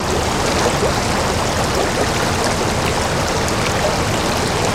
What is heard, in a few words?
River Slw Mono